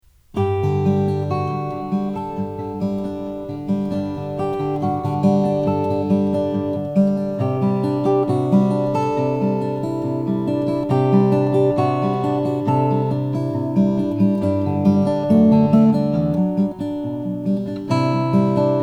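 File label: tony vines sx cutaway madagascar rosewood, guitars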